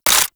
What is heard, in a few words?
AK47 Low Clip; Warframe; Tenno